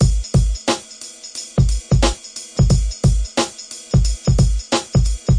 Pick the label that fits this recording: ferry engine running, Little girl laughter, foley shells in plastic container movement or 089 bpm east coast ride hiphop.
089 bpm east coast ride hiphop